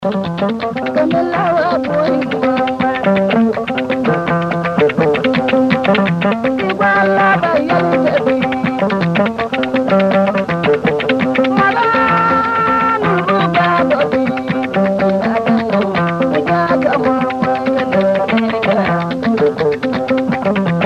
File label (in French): musique du gulmu